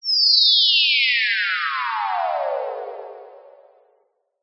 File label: cartoon anime descending whine laser slow